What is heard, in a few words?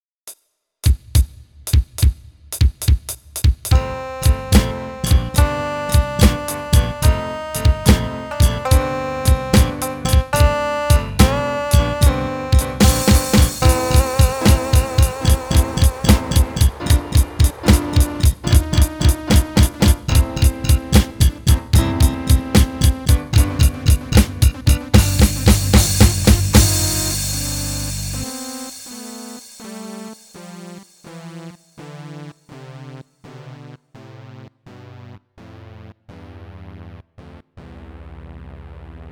MIDI blues